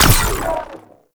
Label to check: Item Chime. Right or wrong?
Wrong